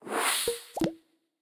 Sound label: puzzle failed buttons appear; Ben 10 - Spot The Difference